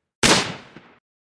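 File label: Lee–Enfield, gun; لی انفیلد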